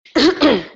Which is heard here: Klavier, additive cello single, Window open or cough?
cough